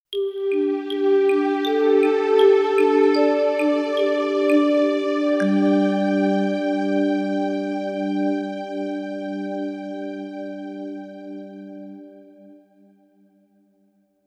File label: Happy Thumb Piano